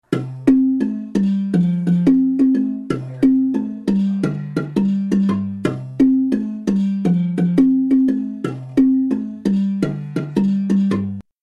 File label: baritone, marimba; Part 3; Baritone -- Jim; babamudiki